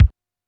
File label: Kick, drumkits 707